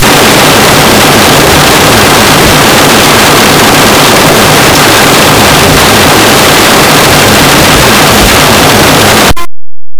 rain drops on a window, raindrops

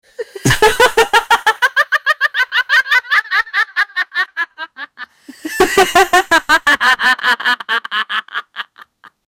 laughing sound; amusement

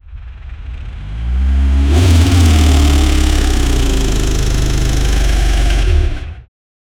a 8.0l quad turbocharged

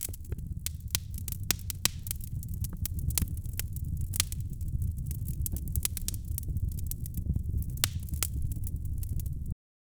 fireplace

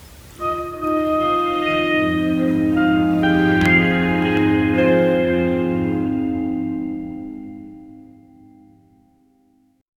warm cinematic music cres; purring

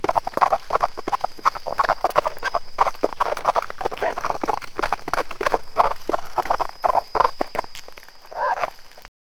fast padded footsteps of, wolf

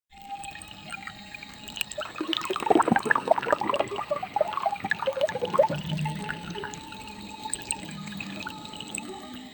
soft liquid ripples blend, meditative

